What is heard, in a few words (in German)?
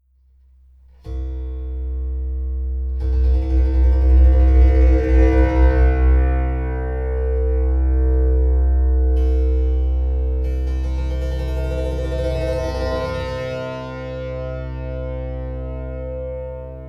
monochord stahlsaiten und basssaiten